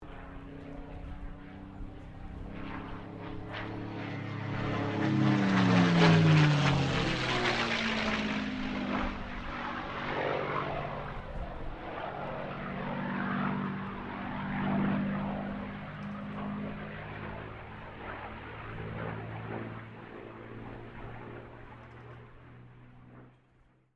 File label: AIRCRAFT CAC CA-18 MUSTANG MK23 PASS WINDY; CA-18 /P-51 Mustang; Airplanes; Vehicles